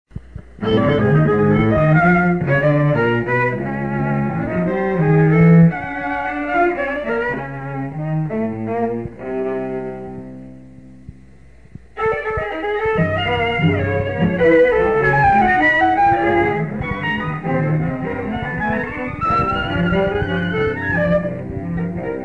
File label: trio